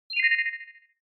Ui Confusion Blip Sytrus Arpegio

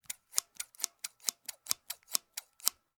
Scissors Cut

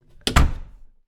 Hollow Core Wooden Door Close

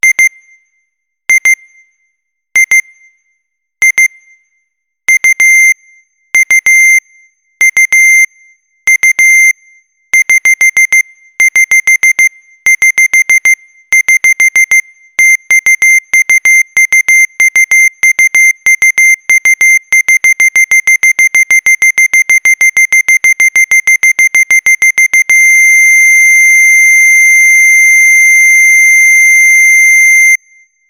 Oldfashioned Alarm

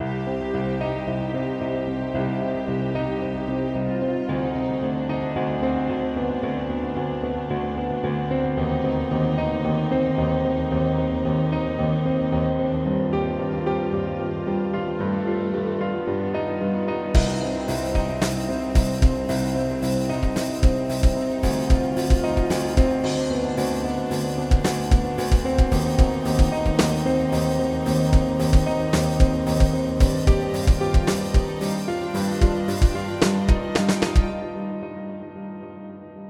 Orchestra Rock

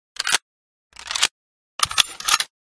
Awp Reload Sound

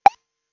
pearl drop

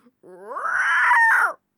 Dino

